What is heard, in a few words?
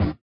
SWUSH; Spectrasonics; Metamorphosis